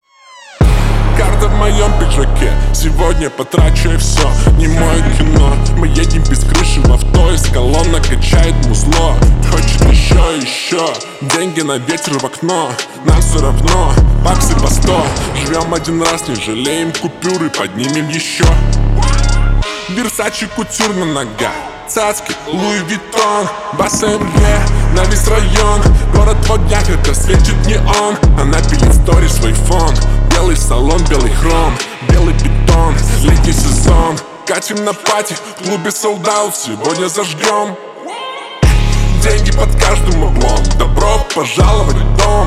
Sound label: Slowed Reverb